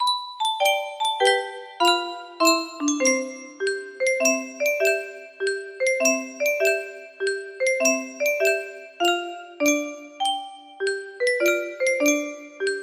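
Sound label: waltz, music box melody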